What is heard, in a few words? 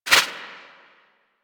Turbulence Deflect; Warframe; Tengu